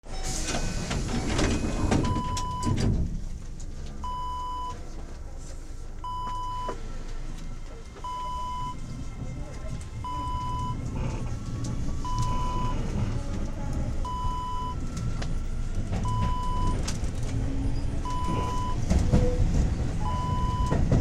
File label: metro int depart